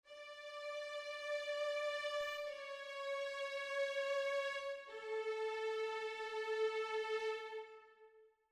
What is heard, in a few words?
Str Samples 2nd Violins